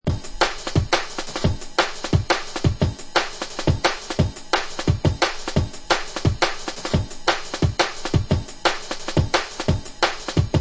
Dark Soldier Revisited, beats; ray keith dread jungle breaks vol 1 60 beats for dubplate clashes battle junkies

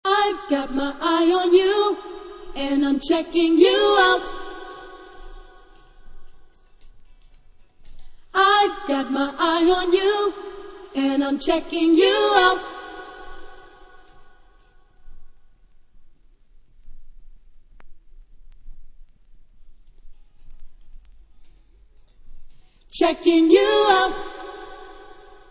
background vocals

